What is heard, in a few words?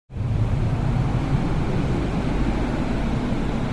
ambient; dystopia; assemble